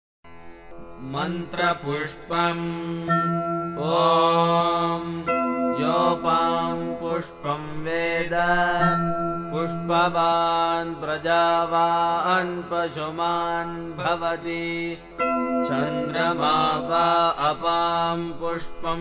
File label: puja mantra pushpam; atmartha puja